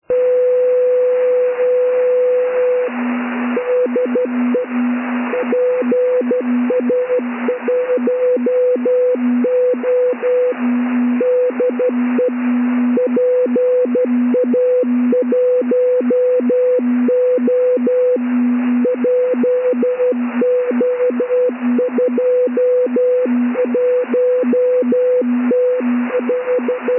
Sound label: beacon